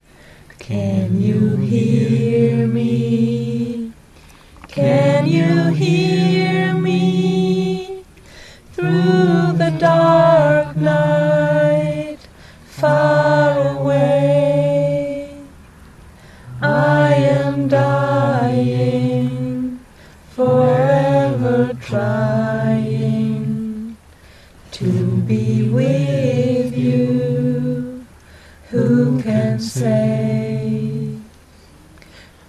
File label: sailing